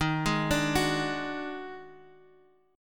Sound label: D sharp Minor Major 7th Double Flat 5th D sharp, down, Guitar, Open G